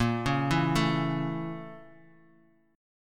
A sharp Minor Major 7th Flat 5th, Bass Standard; arpeggiated; 18,16,14,14; JGuitar

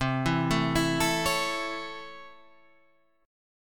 A Major C

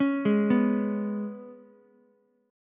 Ab Minor Double Flat 5th Ab down Ukulele; arpeggiated; JGuitar

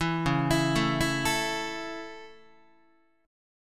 Db Minor Sharp 5th Db down Guitar; arpeggiated; Dbm#5; JGuitar

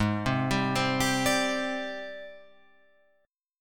Ab Major Sharp 11th Ab, Guitar Open F; AbM#11; arpeggiated; chordshape; 8,7,7,7,10,9; JGuitar

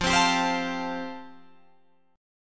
F Major Add 9th, Guitar Drop D

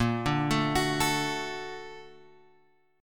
A sharp Augmented Major 7th A sharp, chord; A#+M7 Chord {6 5 7 X 7 5} arpeggiated

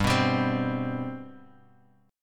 G Major Add 11th, G; Gadd11 strummed; JGuitar

